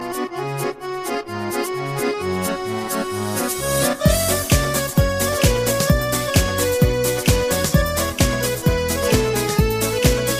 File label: harmonica dance